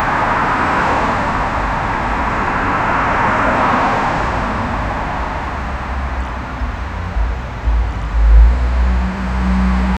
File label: the gentle constant hum; afternoon